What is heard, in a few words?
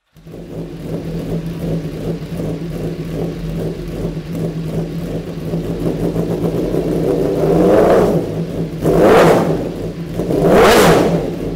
ringtone android